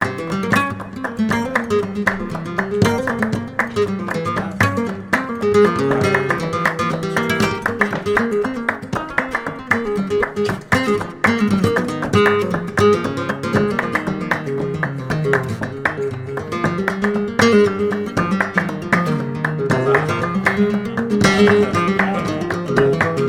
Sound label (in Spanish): falseta, flamenco